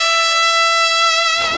Romantic Tp E6 Raw; 音MAD

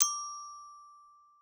gloken

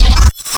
custom bass

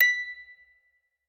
musicbox

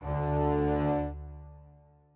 strings

